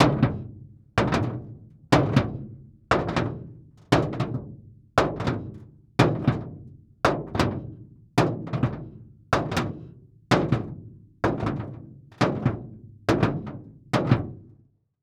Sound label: Foley Footstep Baseball Cleat Metal Walking; Foley Footsteps